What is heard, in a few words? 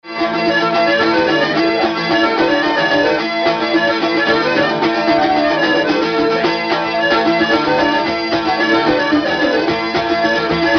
irish scottish